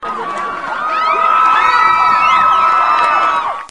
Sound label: cheering